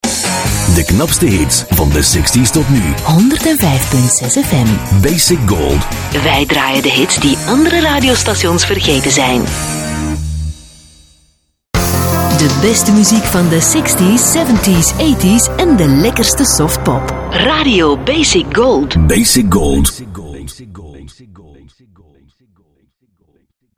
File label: jingles; Basic Gold Eeklo